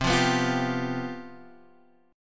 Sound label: C Major 7th Suspended 4th, down, Guitar, Half Step Down